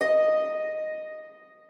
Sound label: harp